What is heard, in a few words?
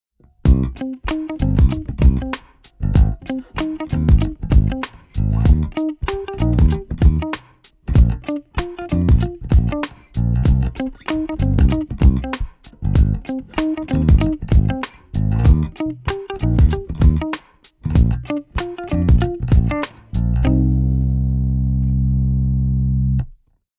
bass cable 2mix